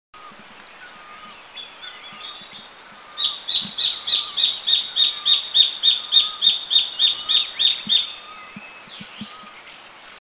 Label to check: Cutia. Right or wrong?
Right